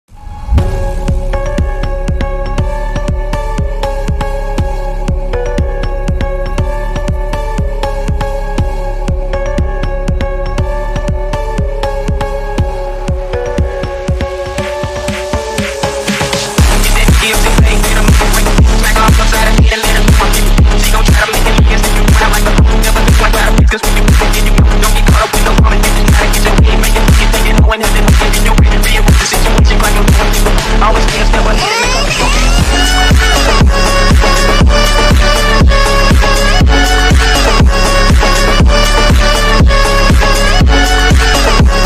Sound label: baby crying phonk